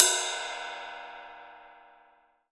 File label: RIDE, MONO RIDE CYMBAL